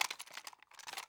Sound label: rifle jingle; Rust